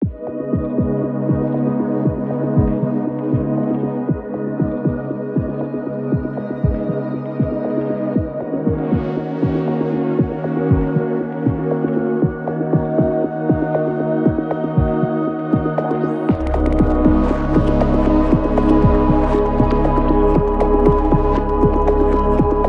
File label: Electronic Ambient; Electronic Ambient Music Pack